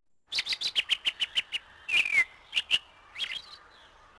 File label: bird; yavin